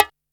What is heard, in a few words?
Boss DR-660 Perc, Drum Machines